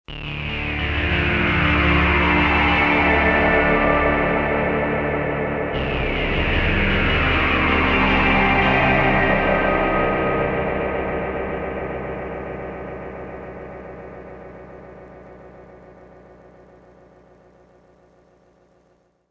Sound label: AMS dmx 15 80S Obi delay